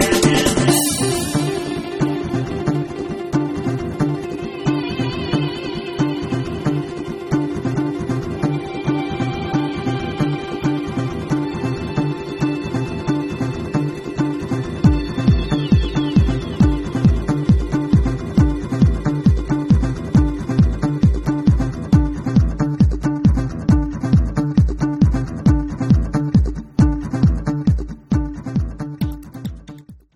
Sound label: string